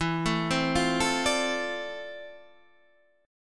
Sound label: F Major 13th E, guitar chord